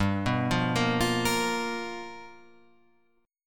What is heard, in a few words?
G 7th Sharp 9th G chord, Guitar Open F; G7#9 arpeggiated; JGuitar